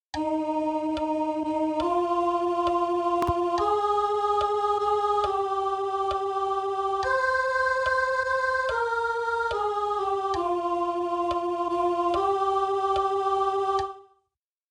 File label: Kyrie SOP